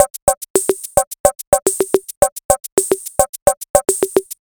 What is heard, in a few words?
SAMBA A